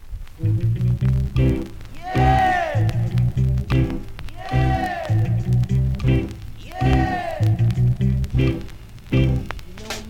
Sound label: REGGAE